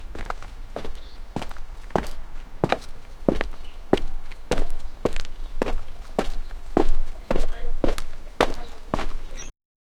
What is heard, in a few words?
man walks through wooden, apartment